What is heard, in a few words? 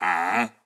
Blacksmith Action